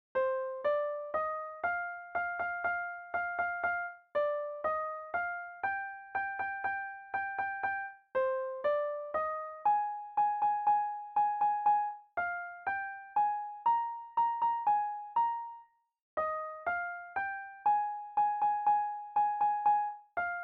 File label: Melody